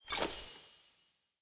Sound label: Player Short Sword Recall Local; Weapons; Swords